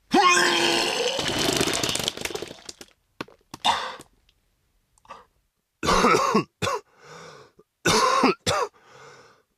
Vomit; scpcb-godot

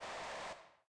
off, PA

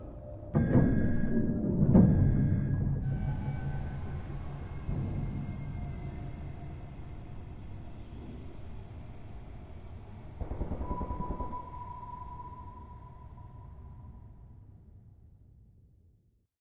Alarm

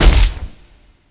Damage; D9341

